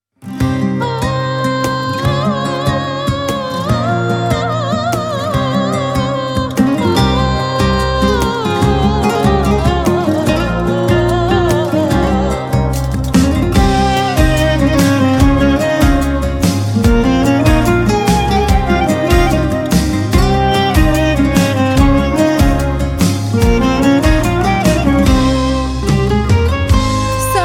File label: Ringtone Mobile